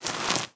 dig clay fem; Immortal

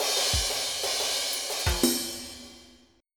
reggae

